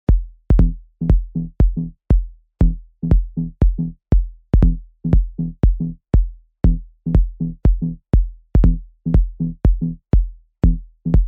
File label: Deep House Country Funk nostalgic Mix